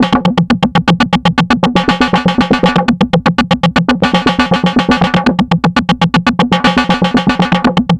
S&H Loop Steel Ring; Vortexual Amplitude

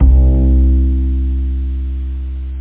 JAZZY ACOUSTIC BASS C2; BassHits

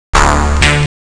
key Error; Computer 2000

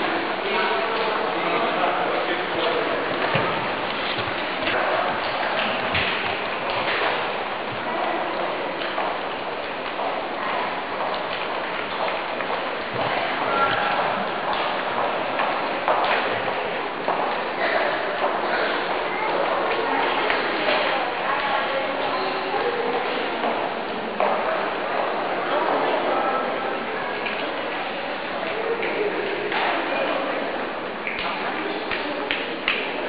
Senate Foyer; Parliament Hill: An Interactive Tour

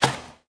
make bricks

